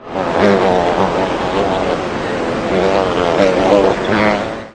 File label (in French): bourdon; classe de grande section